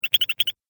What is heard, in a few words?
Arcade Game Chirpy Beeps; Video Game Sound Effects; Retro Inspired Sound Effects - Think Nintendo, Atari, C64, Etc.; Andrew Fleming